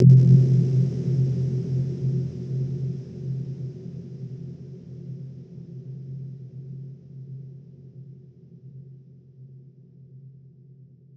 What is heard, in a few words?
Impulse, Pads; Crowdsourced Sample Pack; TRUTH-HZ